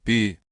song; skibidi trash

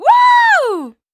Accept

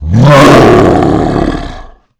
melee attack low; demon dogday

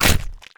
bite, melee; The Monster Plane; kolmods melee

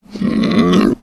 pain; The Monster Plane; kolmods preatt

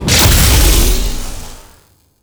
power on short; multiverse skibidi police man guma; xen

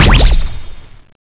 shoot; The Monster Plane; magicsg

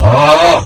aaaaaah; PROMENADE Upgraded Fusion Tool; cursed tool

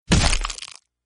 attack hit; sprunki simon evolution pack

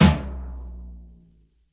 melee; sprunki horror mutant; boss

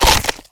infector bite; haggy wuggy bot

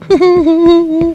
hunter pounce miss; huggy wuggy snpc; elmo

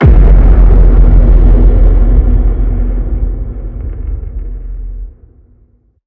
horror; gman laser pizda

